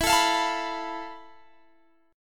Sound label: F Major 7th Flat 5th E, guitar chord